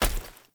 Foley Action Body Hit; Characters; Player

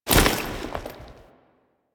Foley Step; Warframe; Kahl

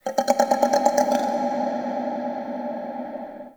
Under City Small Creature

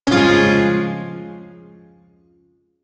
Shawzin Phrygian Chord F; DE; Warframe; Duviri Shawzin

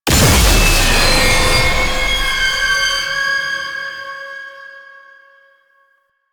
Weakpoint Destroyed; Enemies; Lasrian Tank; Warframe

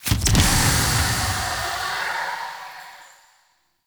Balloon Destroy; Encounters

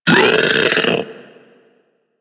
Infested Splitter Top Vocal Attack Medium; Warframe; Grey Strain

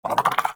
Armadillo Vocal Idle; Characters; Warframe; Hunting Critters

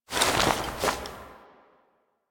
Horse Foley Dismount; Foley Actions; Warframe; Vehicles; Duviri

